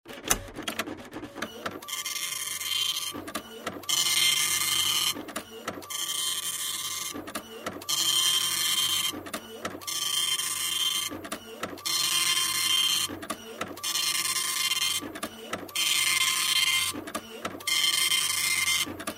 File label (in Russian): rabotajuschij matrichnyj printer